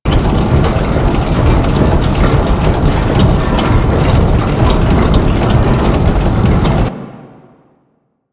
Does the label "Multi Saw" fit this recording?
No